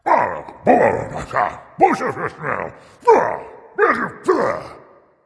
grumble dragon; fafnir

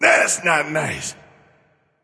grunt hurt

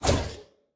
whip swing; smite; osiris

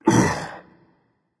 ugh berserker; cuchulainn

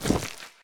movement step; smite; god odin

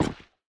movement step; smite; odin

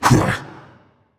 grunt attack

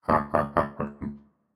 hehheh; cerberus

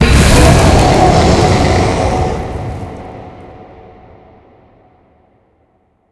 fire giant global death alert; smite

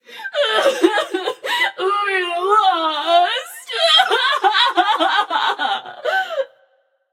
boohoo passion; chaac

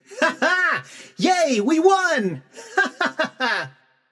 yay; smite; janus

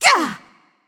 grunt attack; smite; tiamat

